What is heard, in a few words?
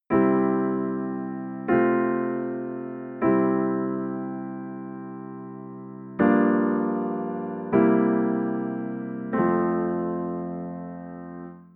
Tonic IV progression